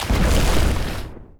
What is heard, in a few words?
Ion Detonate